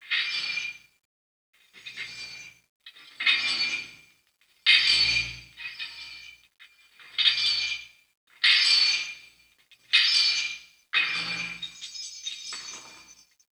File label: Locks Glassbreak, Chalice Well